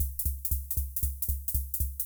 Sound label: Kay R-12 Rhythmer Loop, Drum Machines